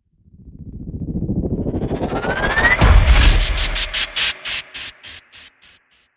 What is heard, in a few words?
Hit Injured Wing